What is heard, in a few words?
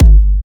Electro Kicks